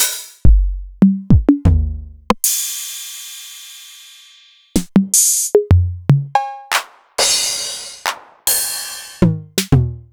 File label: Novation Drumstation